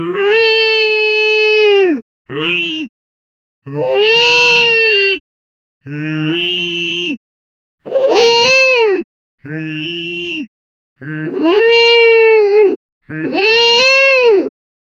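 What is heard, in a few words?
bear grunting in a repetitive; animal communication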